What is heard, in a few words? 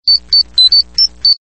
BEEP RINGTONE; POWER RANGERS